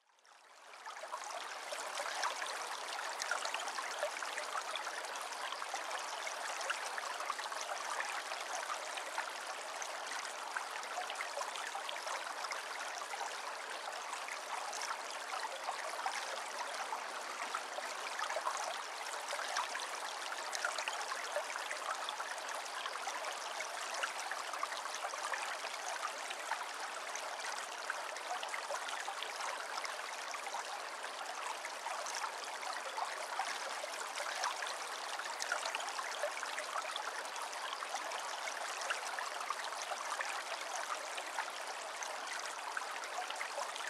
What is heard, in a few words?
Tranquil Stream